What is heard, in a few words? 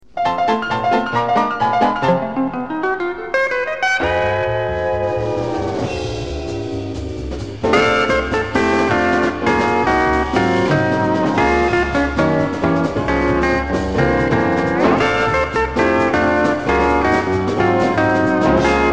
Kicks